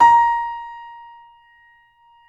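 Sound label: Bb4, YC7 piano; Roland L-CD701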